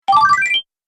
Health Powerup, video game

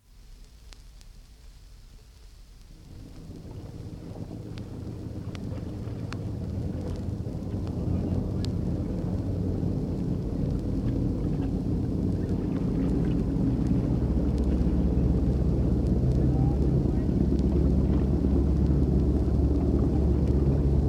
Boats passing with diesel engine (from 78 disc); Historical; BBC

